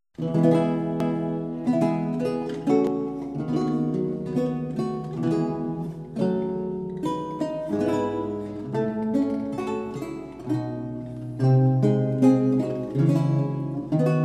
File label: Duets for 2 Lutes in a minor and F Major Sarabande; Duetti barocchi per liuto; Losy